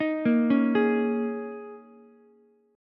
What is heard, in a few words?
G sharp Suspended 2nd Suspended 4th, Ukulele; arpeggiated